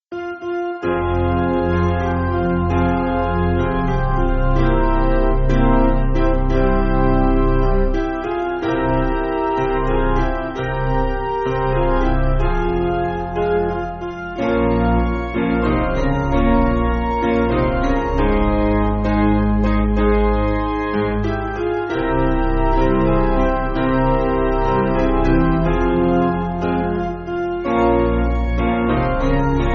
Piano; Fm

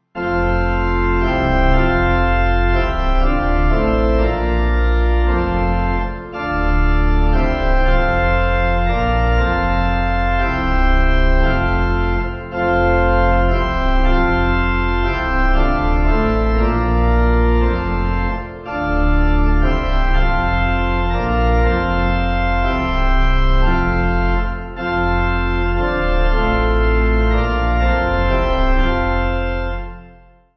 Pipe, Pipe Organ

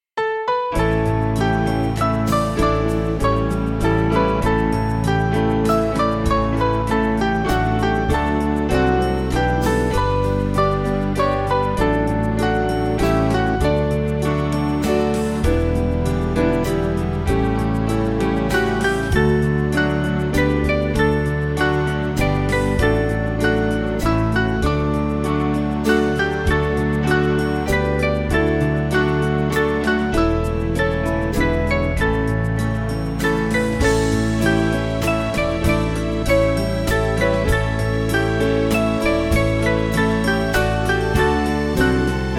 Jesu Is Knocking, Band; O Strand

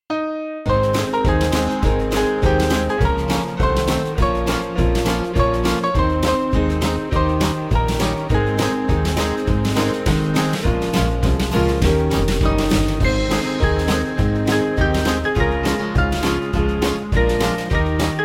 Band

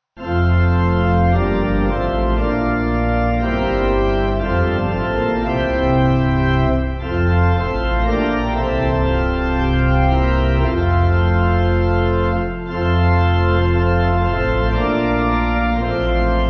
pipe organ, Music Backing Files for Churches; Come Let Us Join; Nun Danket All

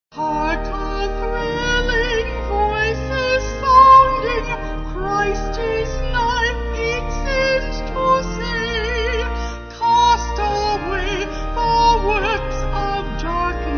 Vocals Organ

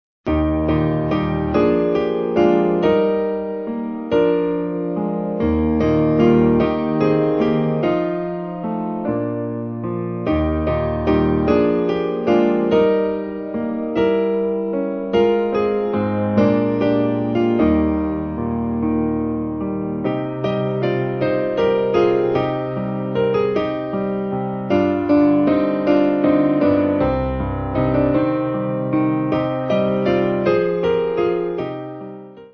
Give Of Your Best, Piano; Barnard

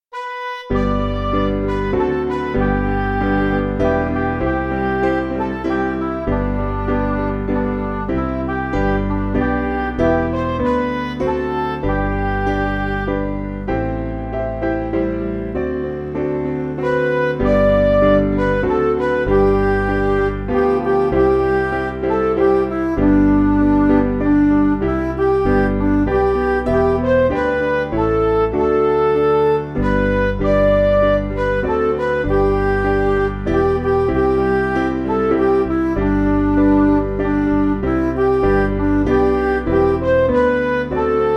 Music Backing Files for Churches

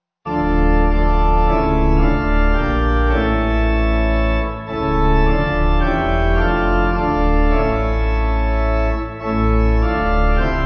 In God My Faithful, Auf Meinen, Pipe

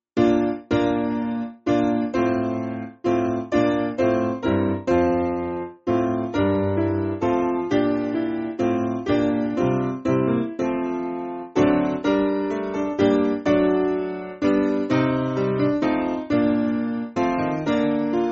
Piano, Music Backing Files for Churches; Where Cross The Crowded; St Bartholomew

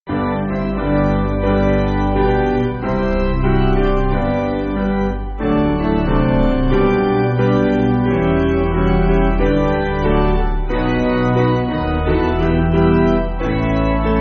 Piano, Music Backing; Breast The Wave; Fortitude

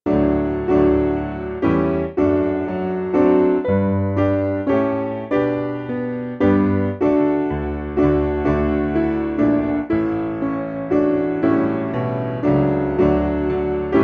Test Our Hearts SPiano; Sweet hour of prayer! sweet hour of prayer!

